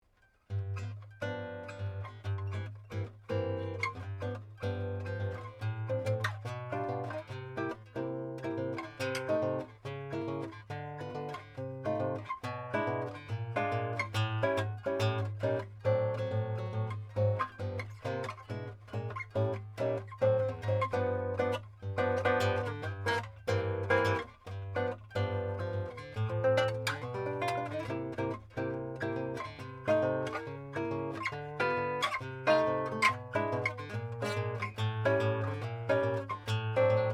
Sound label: Jazz; Cervantes; Jazz Blues / (Jazz standard)